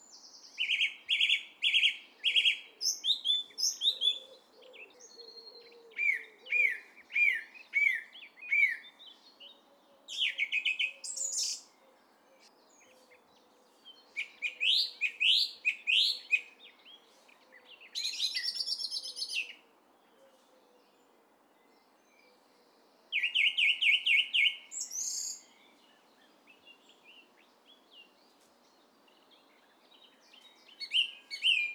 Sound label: Song Thrush